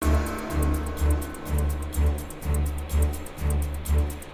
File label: Low Music; Alien Breed Trilogy; PS3; Xbox 360